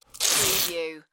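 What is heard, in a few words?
Masking Tape Rip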